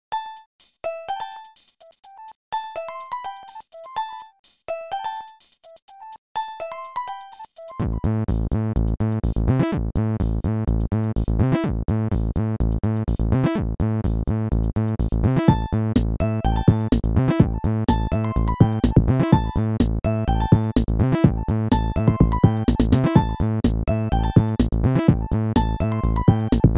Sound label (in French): Robotique; Nokia